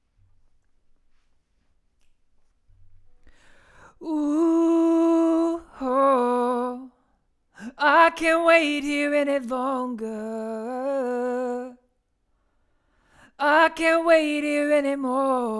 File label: Vocal